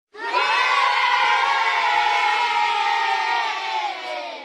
kids cheering

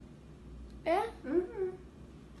eah uhum

